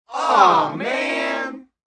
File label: Crowd Aww Man, Small crowd, studio audience